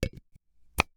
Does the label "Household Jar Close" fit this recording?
Yes